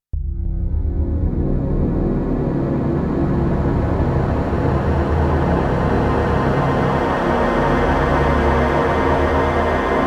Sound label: Reverse Harmonics Bells